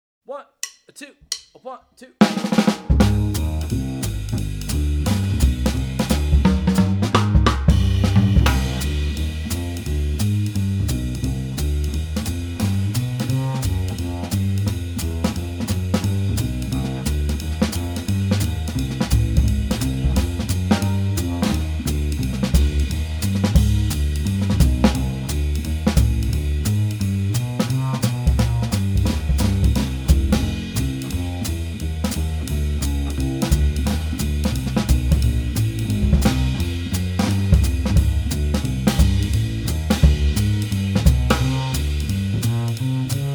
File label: C Minor Blues no piano